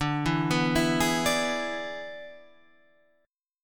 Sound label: E 7th D, guitar